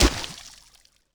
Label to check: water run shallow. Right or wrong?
Right